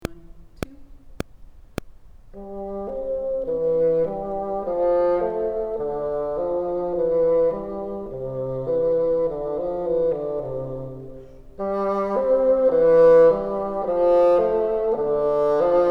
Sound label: top, bassoon; Top Part